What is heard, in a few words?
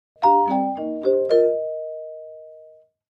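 Clavichord Short Funny; keyboards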